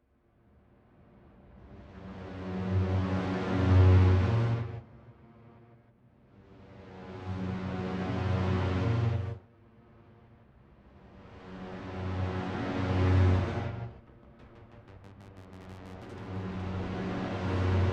Eventide H9 Dark Synthesizer

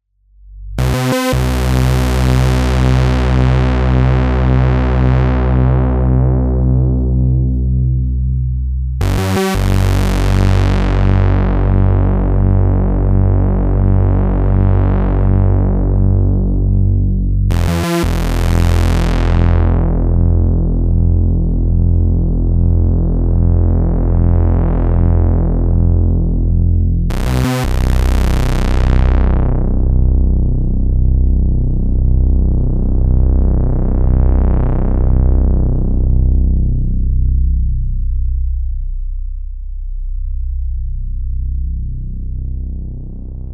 ppg1002 fat VCOs

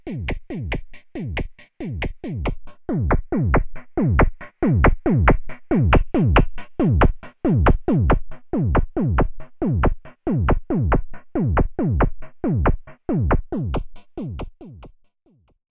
Loops And Drones

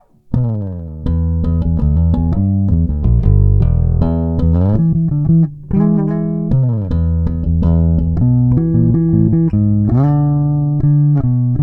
Humbucker

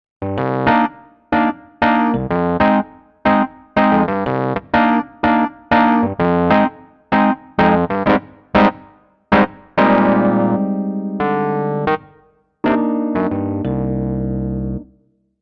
Wurly; Vivo S1

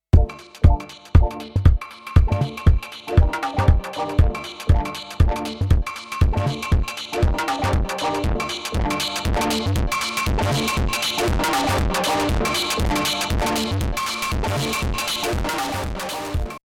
Distortion

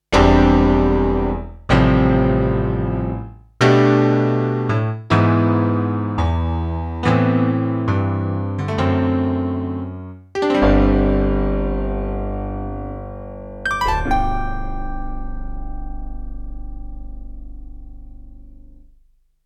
Piano dry

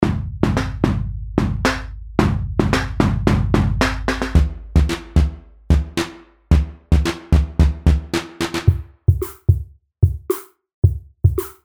chromaphone drumkit; aas

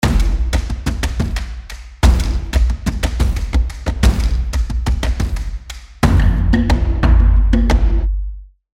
Loop Designer Intricate Rhythms

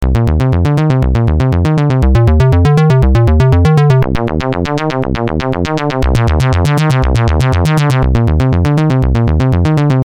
waves

